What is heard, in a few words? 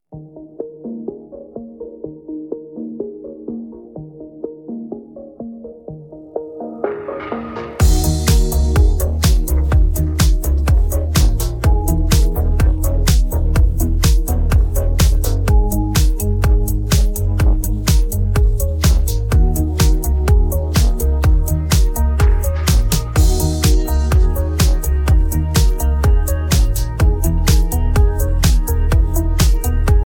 instrumental; persian